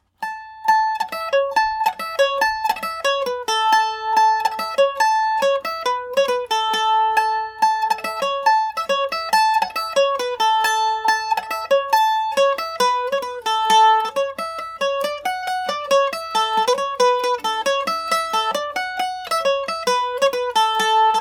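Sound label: A major md304, irish mandolin; mickey chewing bubblegum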